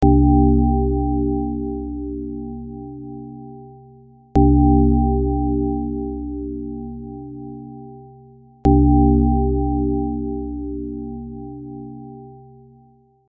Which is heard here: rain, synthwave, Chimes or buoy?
Chimes